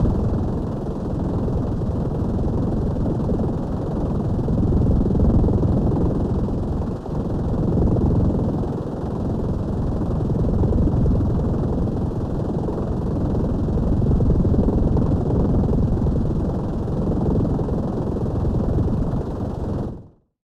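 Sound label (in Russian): синтезаторное гудение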